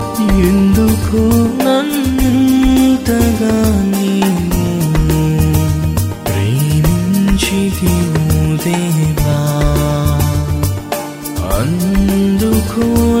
telugu spiritual

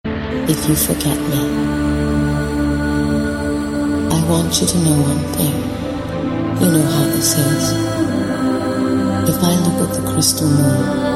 beautiful voice, ringtone

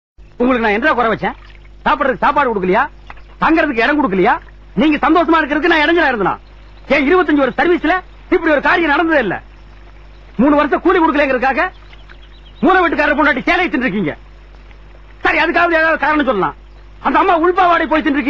chopper ringtone

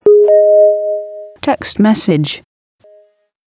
Text Notification

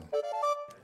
flute; Magic Tavern

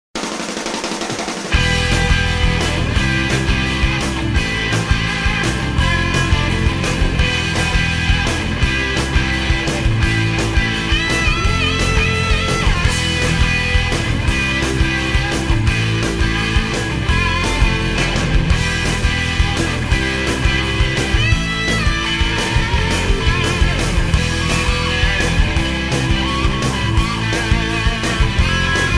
Violin Theme

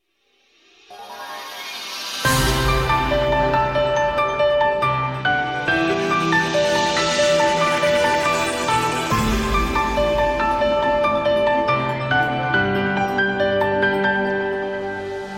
cute anime ringtone; ringtones

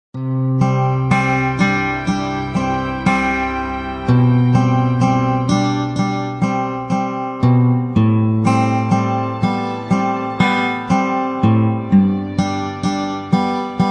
guitar

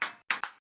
Ping Pong Rhythm

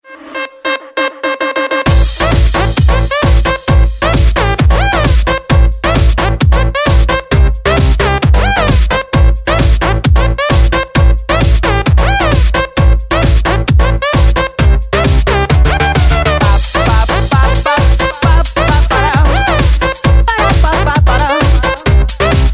best minimal, electronic ringtone

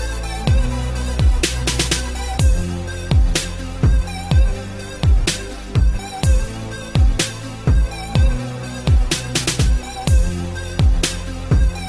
soft romance, ringtones

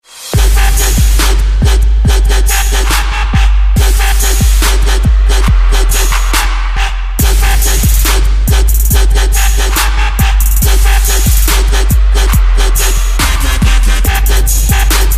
TRAP

